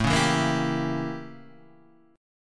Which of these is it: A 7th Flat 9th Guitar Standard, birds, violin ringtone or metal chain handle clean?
A 7th Flat 9th Guitar Standard